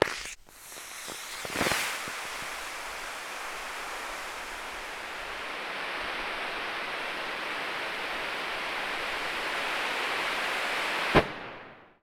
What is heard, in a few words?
smoke bomb